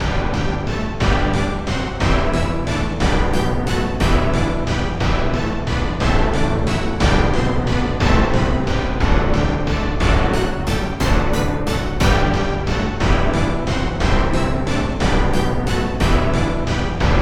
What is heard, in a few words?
orchestra hit